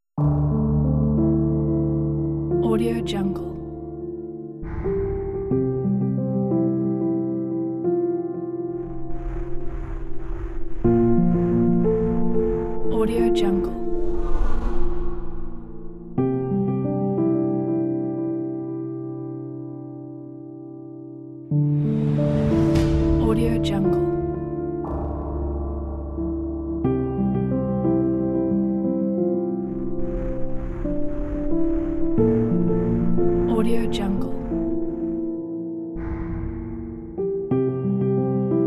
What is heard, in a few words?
sad emotional background